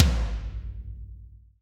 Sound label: TOM; Best Service Gigapack 2